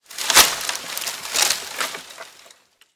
nervnoe sheburshanie bumagoj

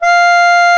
akkordion fa4 f4

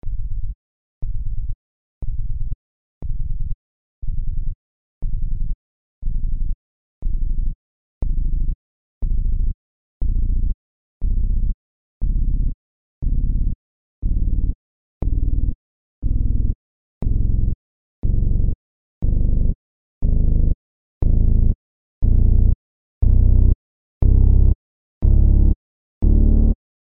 gibridnij bas; mgerharta

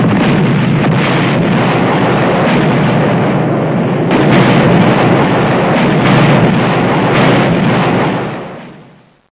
beskonechnij vzriv snaryadov

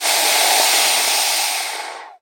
ugrozhayushee yarostnoe shipenie

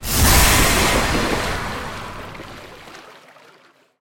neugasimij yarostnij ogon

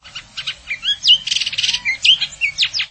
raznoobraznie zvuki ivolgi

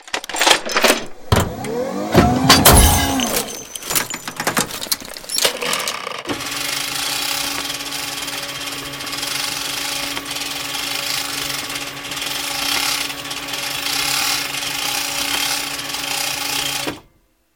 podgotovka k vistrelam minigana ustanovlennogo na voennom vertolete

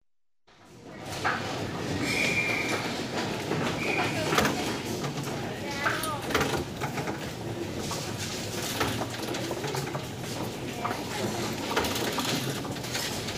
metro turniket lyudi prohodyat

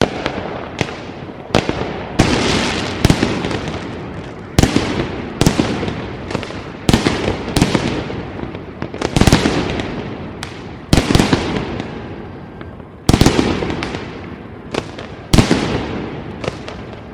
novogodnij salyut v otdalenii

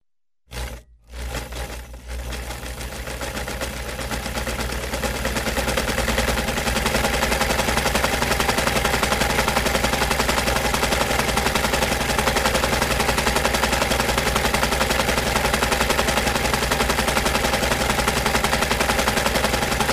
zvuki elektricheskoj shvejnoj mashini

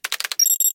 dvojnoj shelchok s piskom

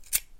metallicheskie nozhnici zakrivayutsya. rezhu tonkij vozduh

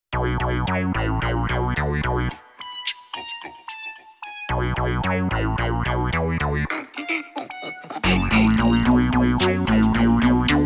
ostroumnaya melodiya

